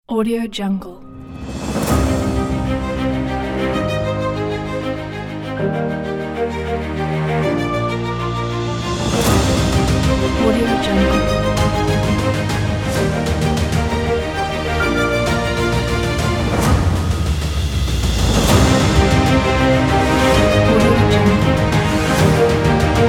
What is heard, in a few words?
epic motivation